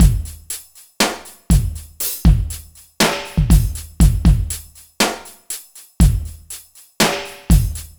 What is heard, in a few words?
drums loop 60bpm